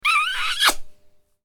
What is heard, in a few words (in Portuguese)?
barulho de beijo